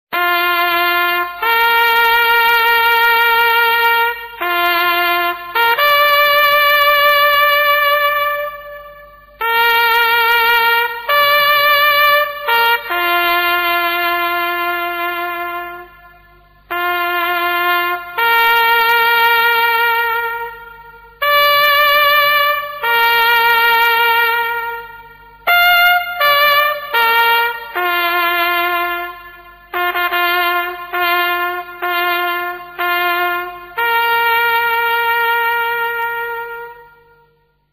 toque de silencio

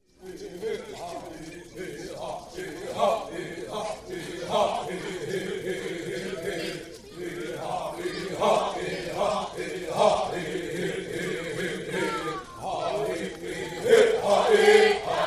Canto final da furacao de orelha

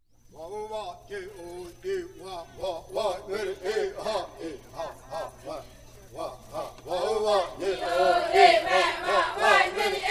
Canto de chegada da corrida das mulheres

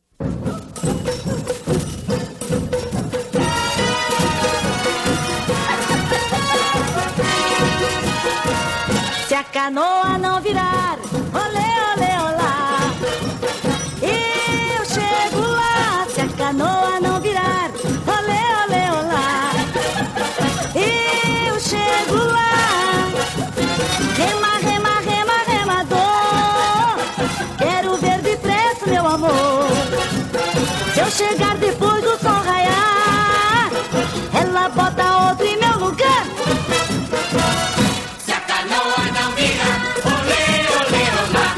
marcha

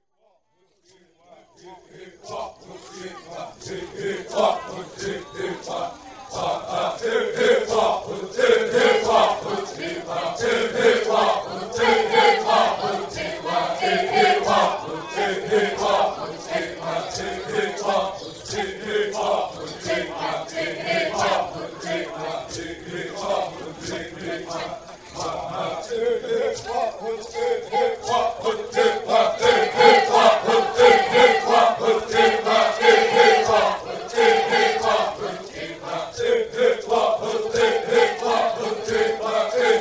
Canto e danca coletiva

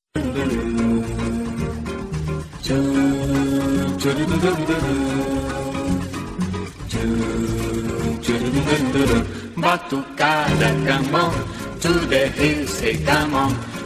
batucada

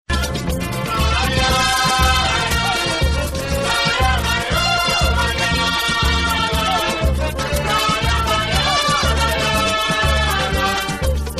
samba de carnaval

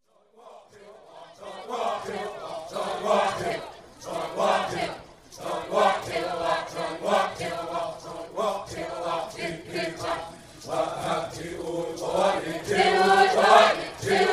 Canto da furacao de orelha

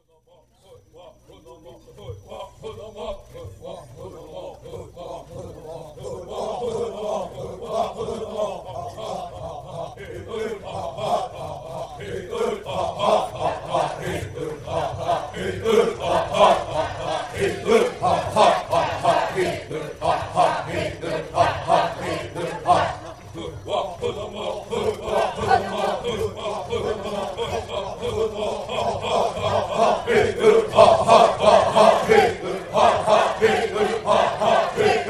canto da furacao de orelha

